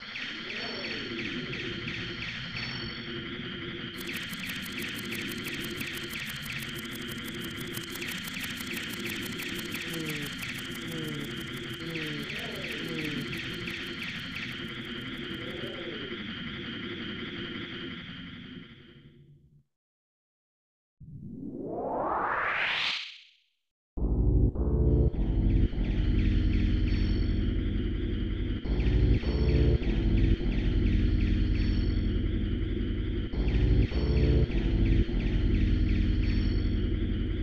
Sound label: Singing of Insects; Rejoice Aretha Oukoku no Kanata; SNES